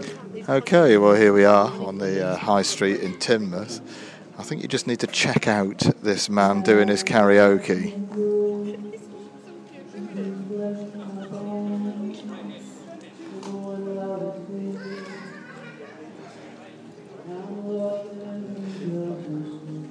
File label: bad singer